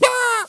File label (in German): Kritischer Abbruch; Win-Sounds